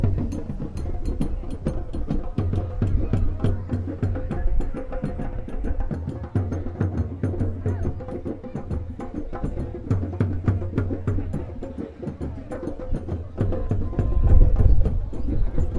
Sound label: drumming, beach sunset; aranbol beach sunset goa